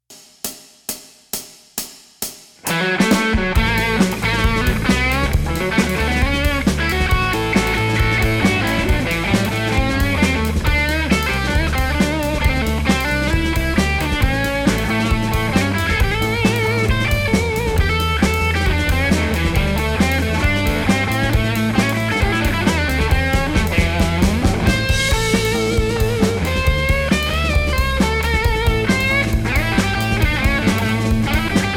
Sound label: Performance, blues slide solos